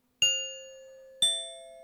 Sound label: Diving hawk